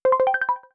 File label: Radio Wave, notifications; LeEco Le 2